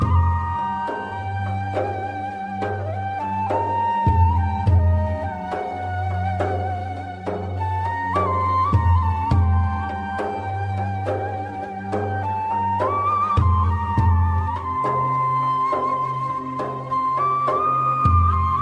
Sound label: flute and keys, Sad